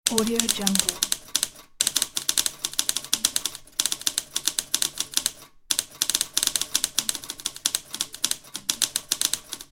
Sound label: typewriter